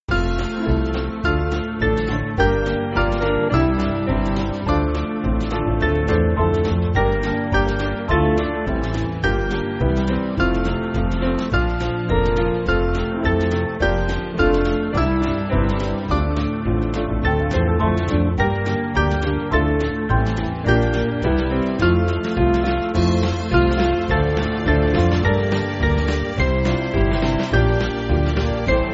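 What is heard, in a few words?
Band, Backing Files for Churches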